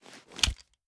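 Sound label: pistol reload empty extended mag end; gmod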